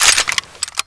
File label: scissors